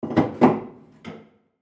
Ship Axe Place On Wall Mount; Boats (Sound Effects:Vehicles)

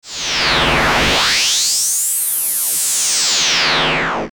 Laser Warble Long Beam; Production Element Imaging Element Accent Transition; Lasers; Laser Sound

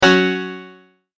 multimedia tone exclamation; McKinney Sound

